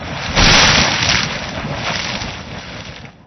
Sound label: Geyser; 暴力赛车